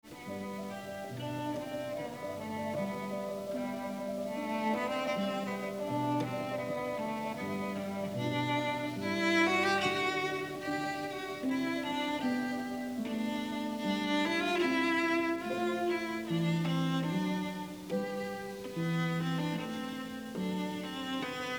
Sonata for Cello & Piano in G; I. Adagio; J.S. Bach

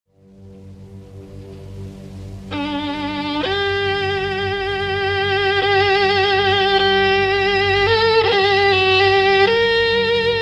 for violin & piano (or orchestra); Bloch; Baal Shem; 3 pictures of Hassidic life; Nigun

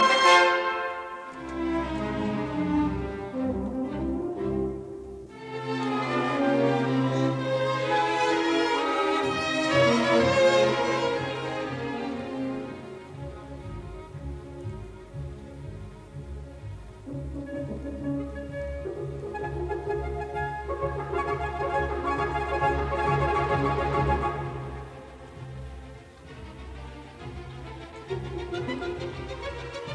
Symphony in E flat major, Scherzo Bewegt; Symphony No 4; WAB 104; Romantic; 1881 version ed R Haas

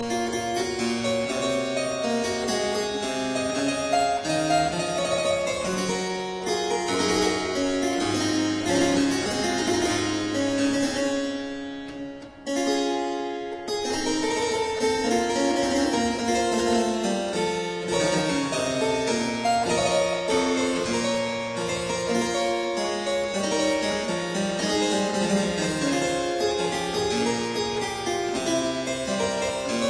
Suite in B minor Allemande; Draghi; Arthur Haas

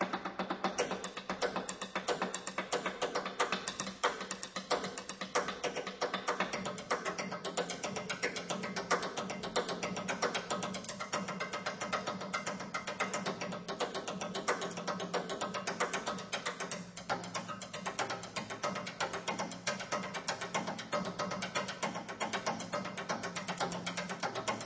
Drums

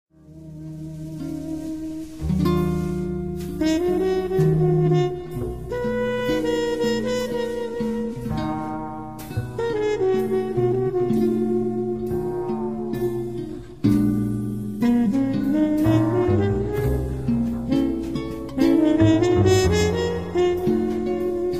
Simple Melody

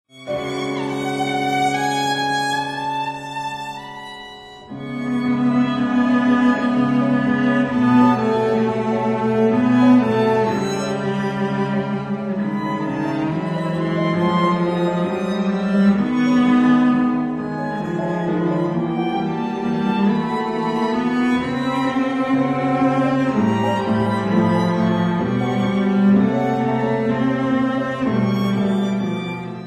Andante for violin & cello; Cowell

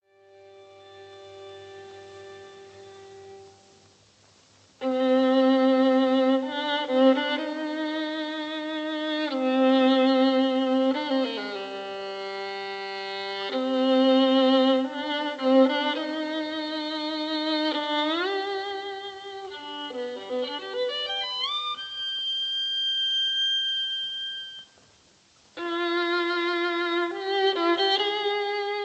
Intermezzo; A cremonai hegedus; The Violin Maker of Cremona; opera

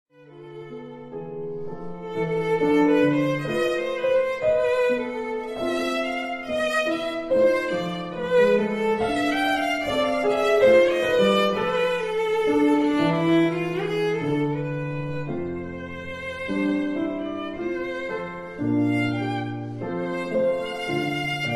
Meditation & Processional For Viola & Piano; Bloch

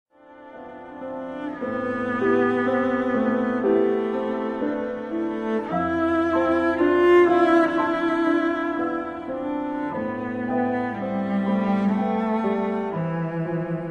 Meditations for cello and piano Romance

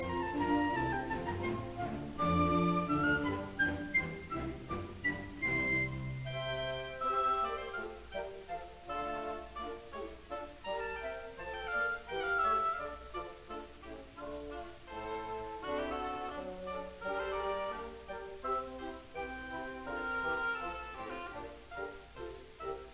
Suite in F major, Hornpipe; Handel; Water Music; arr L Stokowski; Leopold Stokowski

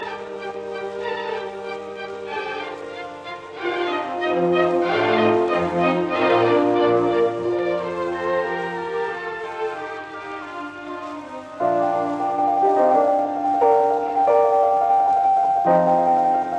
Concerto for 2 Pianos in E flat major Andante; Wilhelm Furtwangler

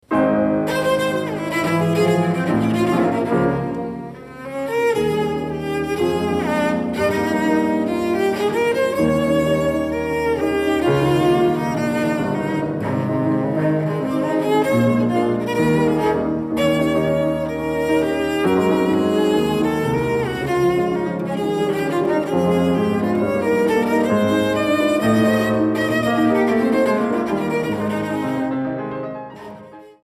Adagio And Allegro For Piano and Horn In Ab Major; Schumann; Op.70

